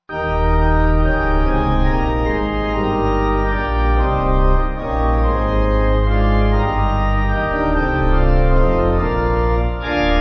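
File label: Pipe Organ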